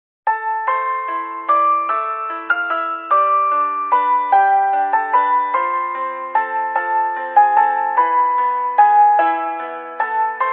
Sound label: Greensleeves Ice Cream Truck Version